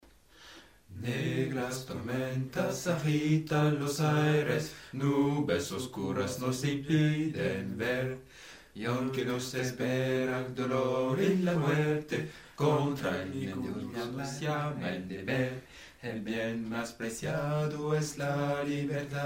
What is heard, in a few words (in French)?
basse; A las barricadas